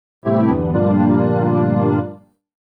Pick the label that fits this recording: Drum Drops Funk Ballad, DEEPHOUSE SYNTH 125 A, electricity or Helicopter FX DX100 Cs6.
DEEPHOUSE SYNTH 125 A